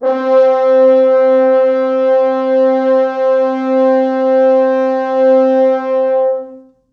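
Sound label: 4 FrHorns SUS, French Horns; C3; East West; Quantum Leap Horns Sax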